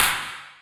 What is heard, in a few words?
Clap